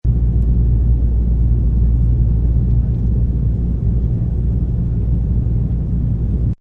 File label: Airplane A74 Int Ambloop; Airplanes; Vehicles; Partners In Rhyme